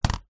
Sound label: technology computer keyboard bluetooth small down on desk